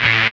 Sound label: DR. LOOP, BUZZ; X-Static Goldmine 3